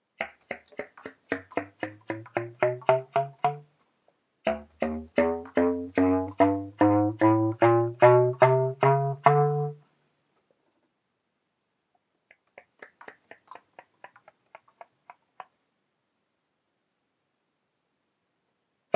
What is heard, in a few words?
bass clarinet slap tongue stuff